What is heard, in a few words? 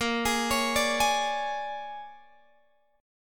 Bb 7th Sharp 9th Bb, Guitar Open G; Bb7#9 arpeggiated; x,15,18,18,15,18